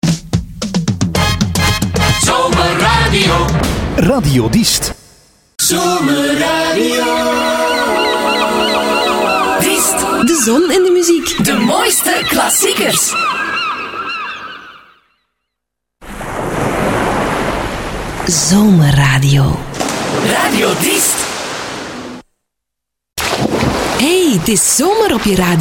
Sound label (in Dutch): jingles zomer; Diest